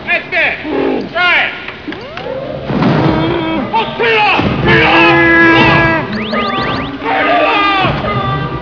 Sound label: turn off